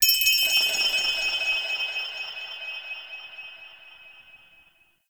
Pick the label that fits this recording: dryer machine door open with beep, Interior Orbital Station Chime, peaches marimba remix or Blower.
Interior Orbital Station Chime